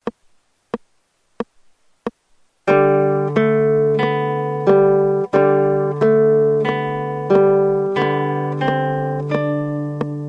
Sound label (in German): Uebung, gitarre